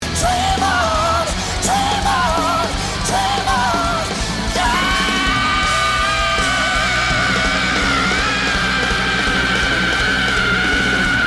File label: screech